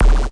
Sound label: ufo kick, electro FX; UNUSUAL KITS and FX; Atomhard Electro FX